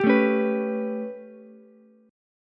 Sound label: A 9th A, Ukulele; strummed; A9 Chord; JGuitar